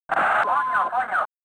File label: Coin